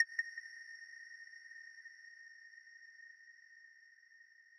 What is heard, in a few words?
arp